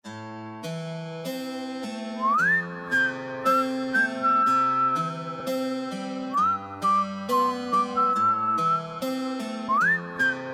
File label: Whistle Intro